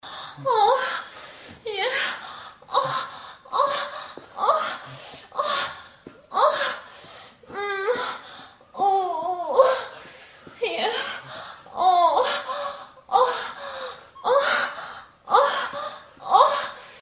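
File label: male female sex indoor; Sexy Witch 2 The Bungler and the Witch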